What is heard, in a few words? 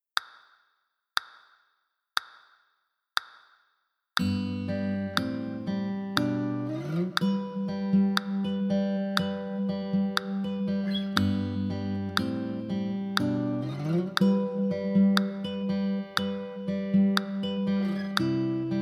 guitar 60bpm